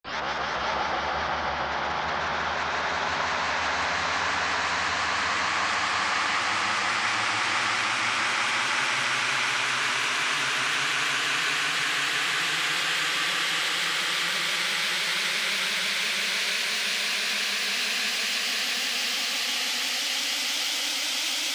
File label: Steam; Korg MSC-14S Sound Effects